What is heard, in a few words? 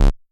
bass